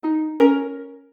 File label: match leave